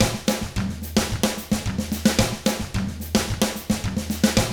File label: 110 SMBA samba; ILIO; Double Platinum Drums 1